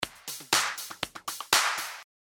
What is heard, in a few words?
hihat clap